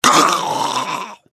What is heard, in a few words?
feral ghoul death; npc